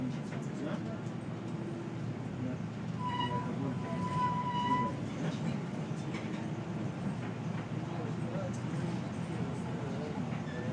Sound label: squealy bendy bus